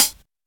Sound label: Hat Sample D#, hi hat one shot tuned to the D# note. Loudest frequency: 7366Hz; hat samples